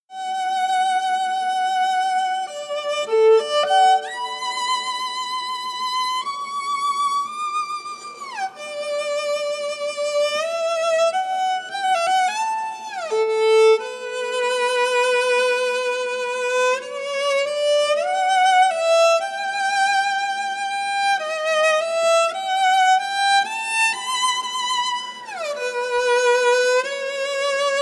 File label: Meditation